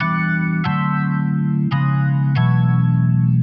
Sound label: Organ 140 E, jazz keys, Chordy Loops, 140bpm